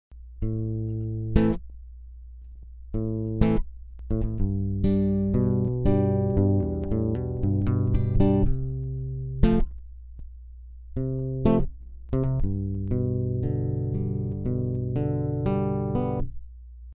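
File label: rhythm, Guitar 1 (basic strumming), Am, G, F, G; fingerstyle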